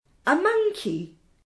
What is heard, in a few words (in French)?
singe